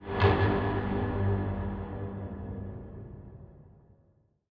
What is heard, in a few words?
music - ambient mood short; You Are Empty; totalitarianism - ambientmood1